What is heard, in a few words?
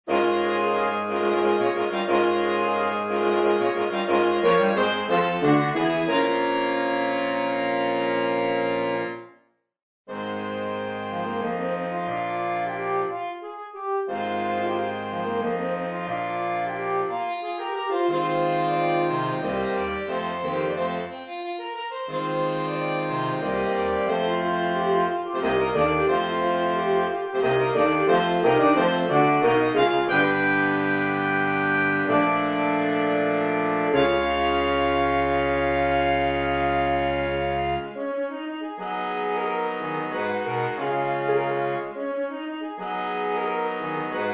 Fanfare Saxophone; Dukas; La Peri